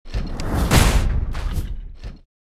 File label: Iron Fist